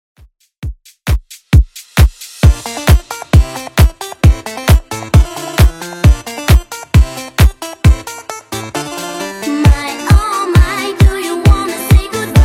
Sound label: 90s Redrum 133bpm CLEAN